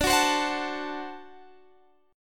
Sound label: D 7th D down